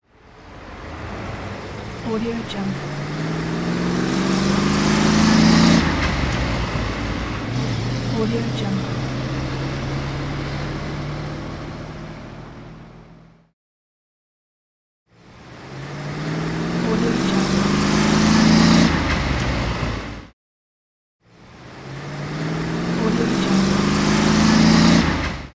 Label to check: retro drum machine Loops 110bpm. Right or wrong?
Wrong